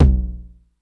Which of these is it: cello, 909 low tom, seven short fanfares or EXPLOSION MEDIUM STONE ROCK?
909 low tom